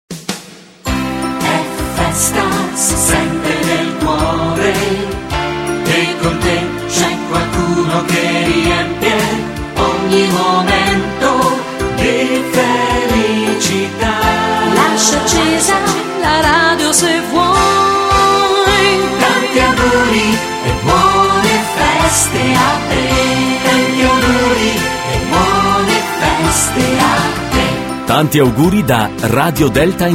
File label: Jingle